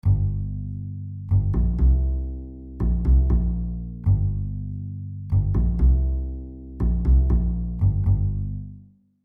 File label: bass lick; instrumentos; baixo; licks para baixo